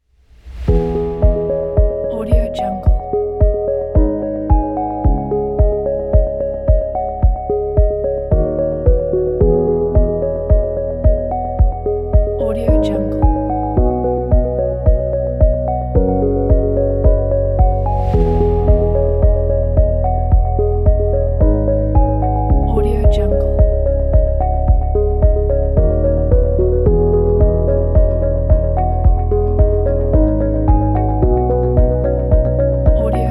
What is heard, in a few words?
interview intro